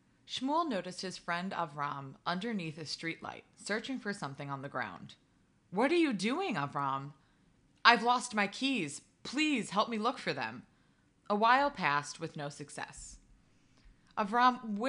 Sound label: Laugh Track; Joke